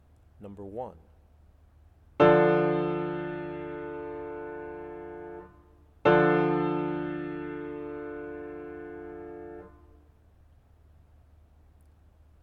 chords; eartraining

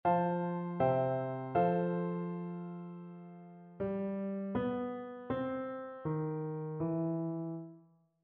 melodic fragments

